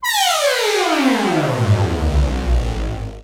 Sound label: BUZZY, Trance Fusion; ILIO; BUZZY BOY